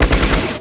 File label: laser; acdemo; AC Demo 3-14-98 RipCord